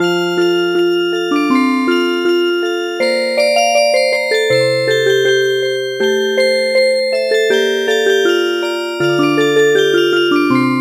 Music Box, midi traditional; Yue er ming

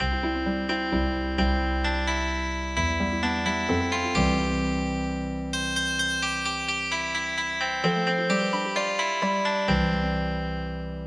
Healing Harp

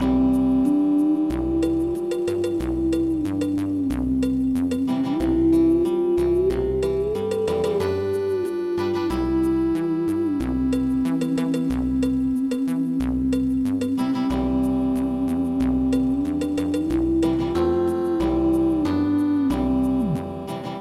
western; frog; kaeru

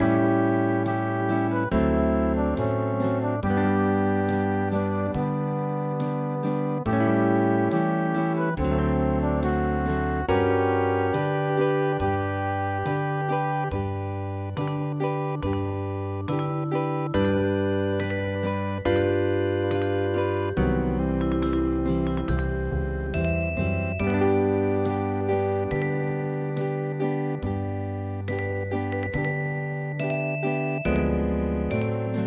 Hawaian, midi; NANI KAUA I

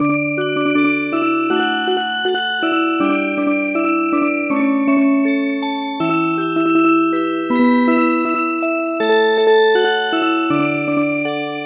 Music Box, traditional; Sleeping aunts

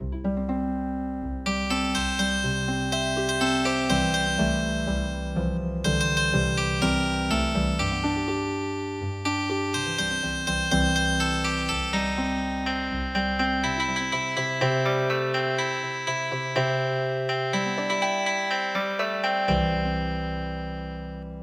Healing Harp

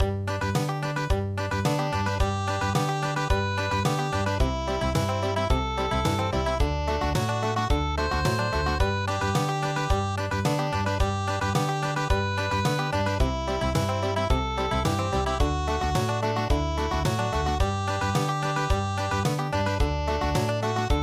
country; foggy foggy dew

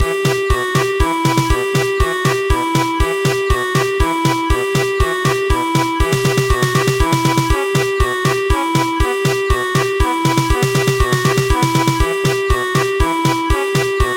German Polka; Carol of the Bells

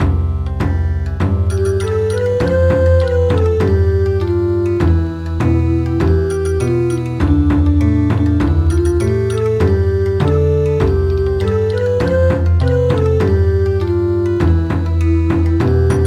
Bon Odori, midi; Good evening Kings

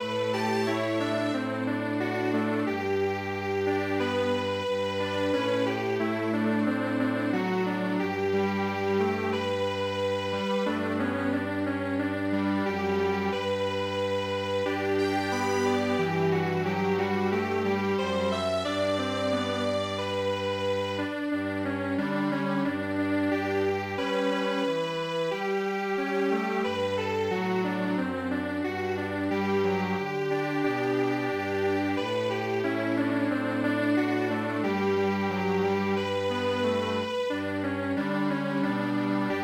Strings Quartet; Alekoki

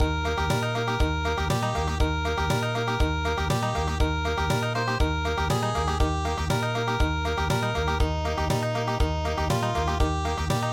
country; she wore yellow ribbon

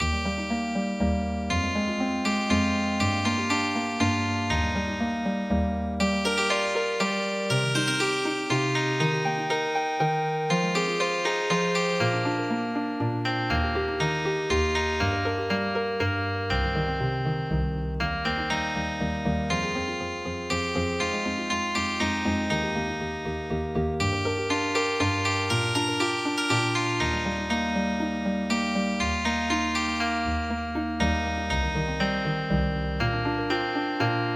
Healing Harp, traditional

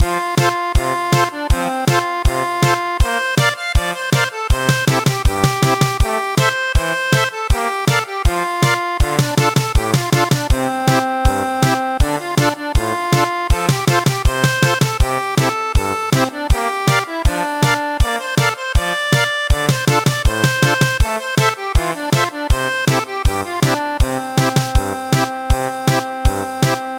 German Polka, midi traditional; Yue er ming

